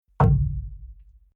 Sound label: Industrial Plastic Barrel Full Of Water Hit Impact Wabble